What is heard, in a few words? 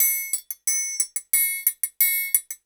90 TRIANG